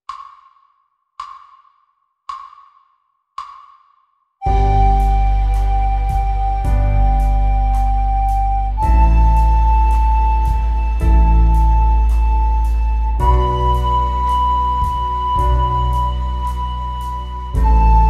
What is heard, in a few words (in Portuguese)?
Flauta Doce Estudo; licoes para flauta doce